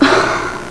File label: sigh